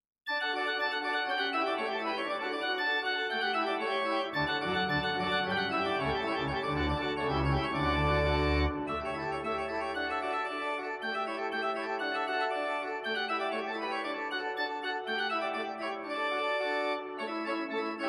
saint-pierre de sales marennes, organ

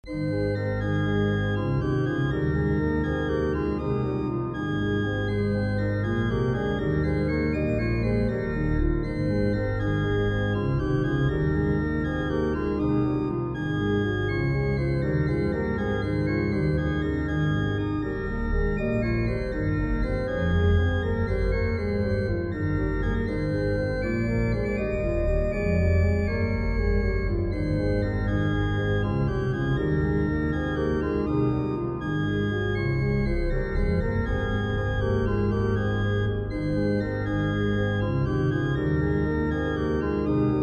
softly and tenderly reverb, organ

